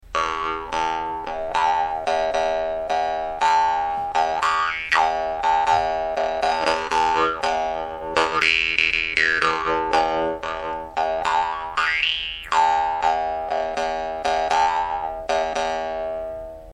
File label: altai komus; vpotkin; vargan